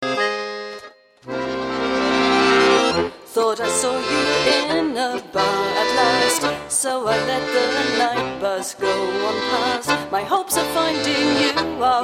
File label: accordion